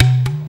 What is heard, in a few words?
WATERCAN; Best Service; World Colours